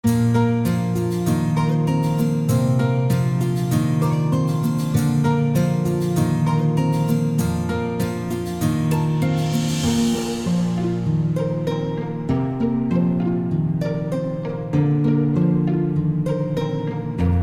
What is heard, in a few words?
stepping pebbles no drums